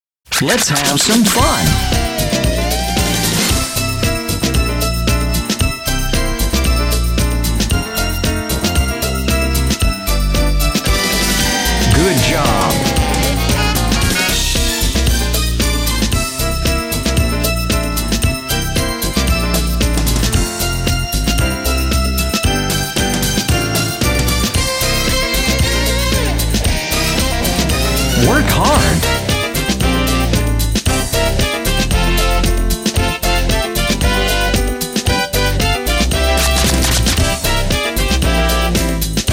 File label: Jingle Jazz Rap with Voice Overs